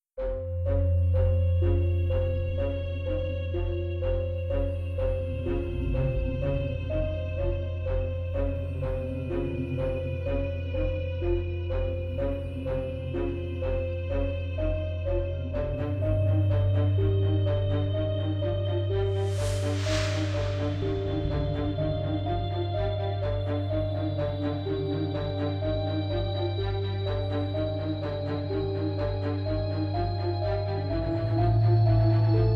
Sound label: Trailer Horror; garageband